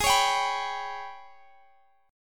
G sharp Minor Major 7th Double Flat 5th, Guitar Standard; strummed; x,x,18,18,20,19